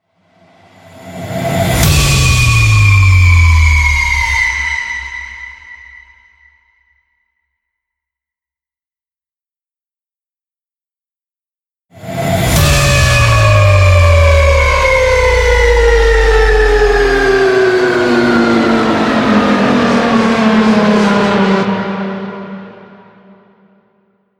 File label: downers harrowed scream